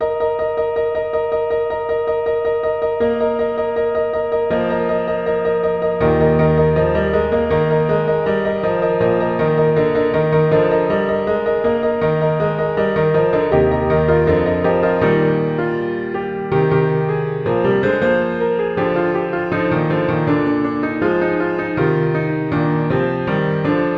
piano arrangement

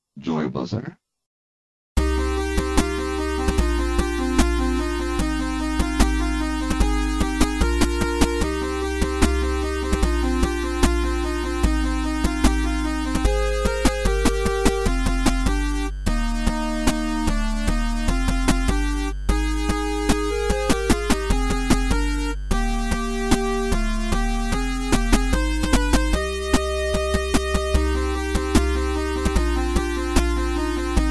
8 bit mix

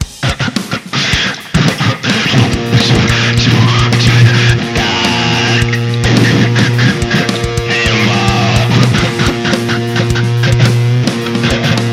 cock metal

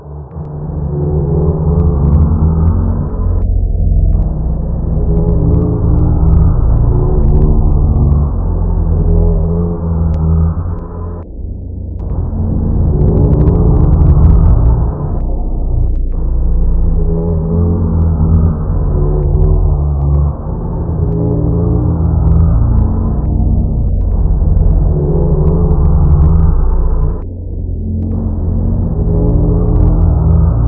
the swarm loop

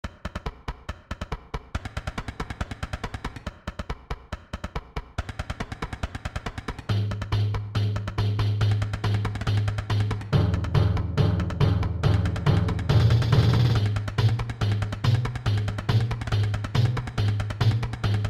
ocarina; ocarina of time

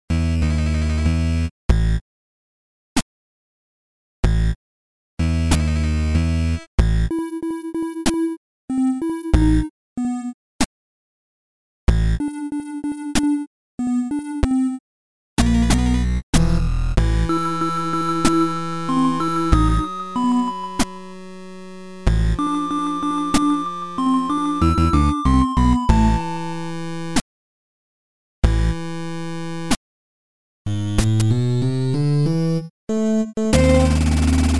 8 bit mix; Luigi's Mansion

